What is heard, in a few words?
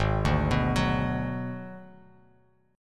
G sharp Minor 7th Flat 5th G sharp down Bass Standard; G#m7b5 arpeggiated; JGuitar